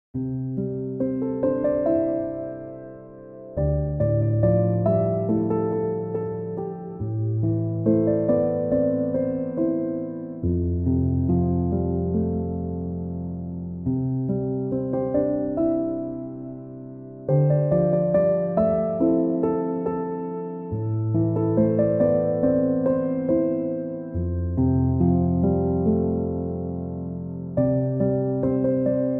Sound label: chill piano instrumental